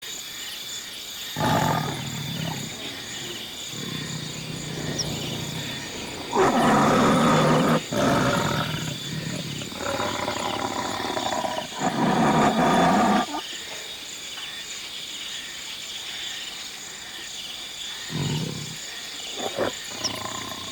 African Animals Ambience; Animal Planet Soundscapes

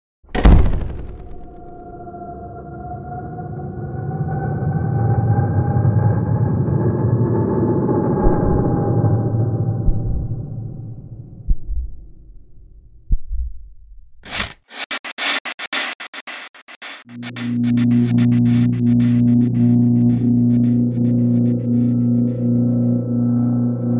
Interior; Field and Foley; Interior Sound Design

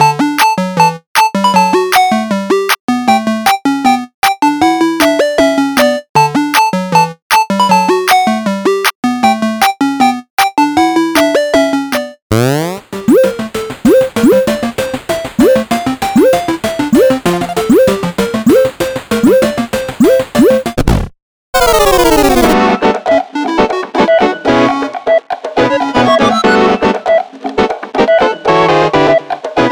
8-bit Arcade; Field and Foley

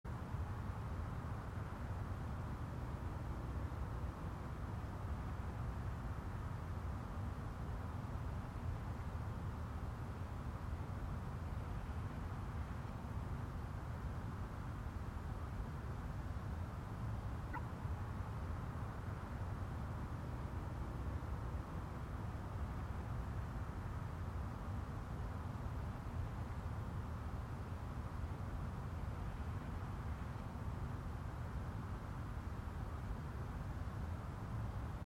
Roomtones; Big Room Sound

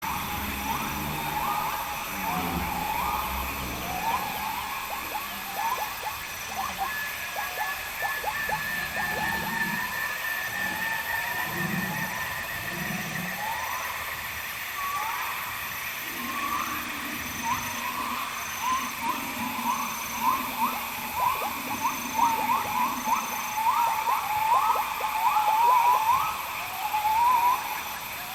Tanzania Animals Ambience; Cloud Nine Records

